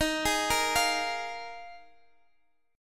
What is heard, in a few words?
Eb Suspended 2nd Suspended 4th, Guitar Open F; arpeggiated; x,x,15,15,13,12